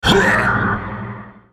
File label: effort; Nautilus; Character Voices - English